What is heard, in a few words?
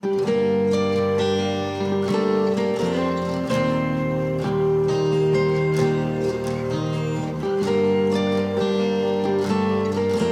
Acoustica; They Always Run